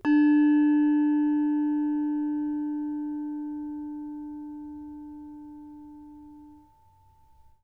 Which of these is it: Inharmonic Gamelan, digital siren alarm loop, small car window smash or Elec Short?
Inharmonic Gamelan